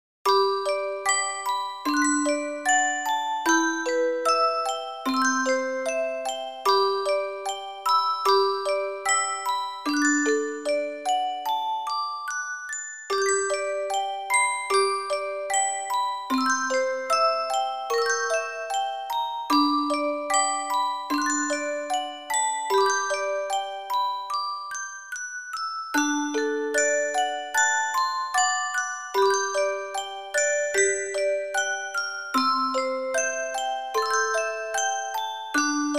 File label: wedding ceremony